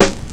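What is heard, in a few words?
snare break, Yamaha Motif ES drum machine; 300 Drum Machines; Yamaha Motif ES-Series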